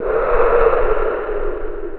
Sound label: breath; mother2